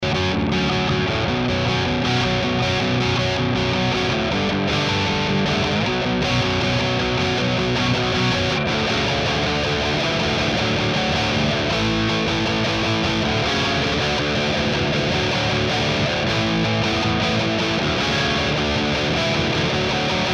5150 solo

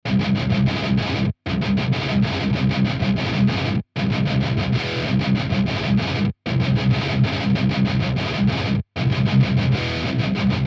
revv 4 gity; krakenrevv

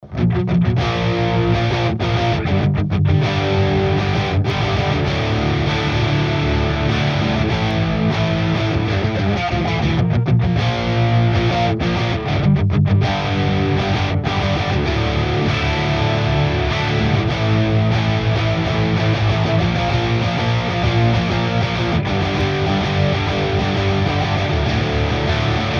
guitars

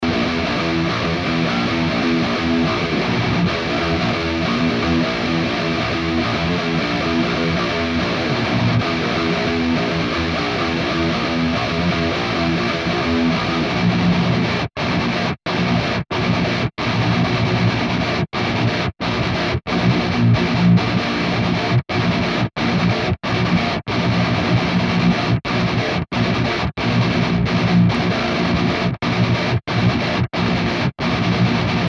4 guit kemper; slo100 comp

